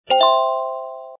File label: right answer